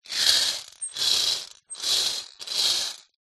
leisure toy bingo balls roll in plastic cage short turns x4; zapsplat